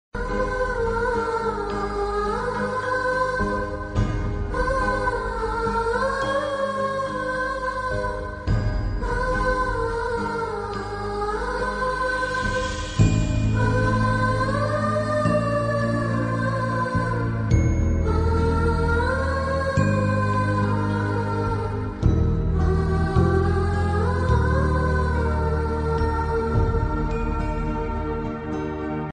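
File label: movie background music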